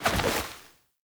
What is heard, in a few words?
Generic Air Roll Whoosh; Warframe; Characters